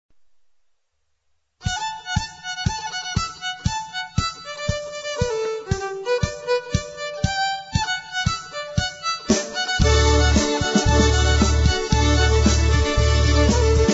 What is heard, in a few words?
hoedown, polkas